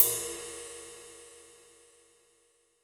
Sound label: Ride Cymbal; Roland BK-5